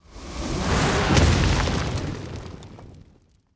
Fire Spell, Magic Sounds; Fantasy Sounds Bundle

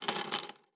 Chain; Fantasy Sounds Bundle; Craft

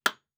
Nail, Craft; Heroes of Hiis; Fantasy Sounds Bundle

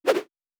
Whoosh; Fantasy Sounds Bundle

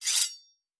Weapon Draw Metal; Fantasy Sounds Bundle; Medieval Combat Sounds

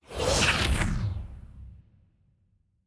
Arcane Spell, Magic Sounds; Fantasy Sounds Bundle

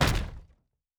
Staff Hit; Fantasy Sounds Bundle; Medieval Combat Sounds

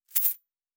Coins; Fantasy Sounds Bundle; Fantasy Interface

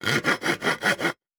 Saw Wood; Fantasy Sounds Bundle; Craft

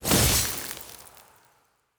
Ice Spell, Magic Sounds; Fantasy Sounds Bundle

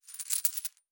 Coins; Fantasy Sounds Bundle; Fantasy Interface

